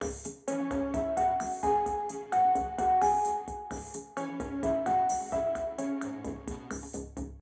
Elevator Music; Jydge

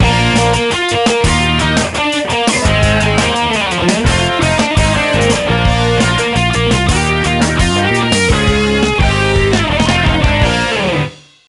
Challenge tune; Squeeballs Party; nds

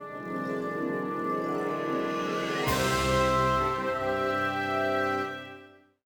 Piano; Haunted Mansion; xbox

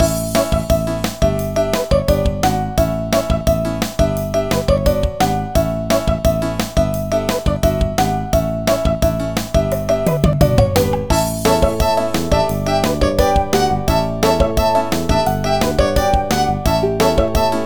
BGM Menu; Junior Brain Trainer 2; NDS

